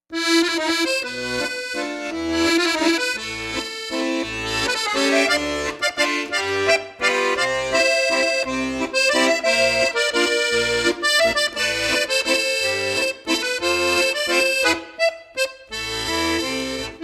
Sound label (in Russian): akkordeoncik